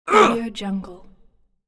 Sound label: fight grunt